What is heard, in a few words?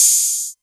808 Hat O; Novation Drumstation